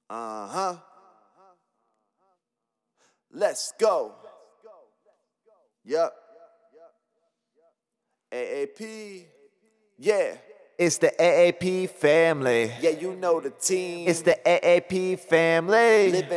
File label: Beat HH 73 Em